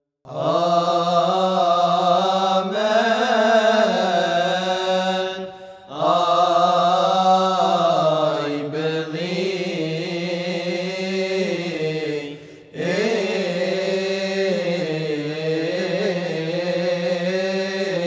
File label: Amen